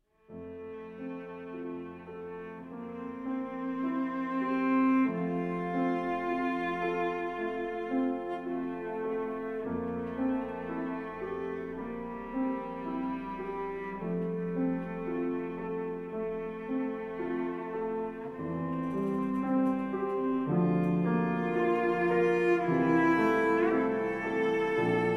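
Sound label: piano trio; ２楽章; 即興演奏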